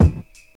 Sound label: 90s hip hop kick drum sound E key; kick drum samples